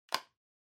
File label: Bingo Card, Punch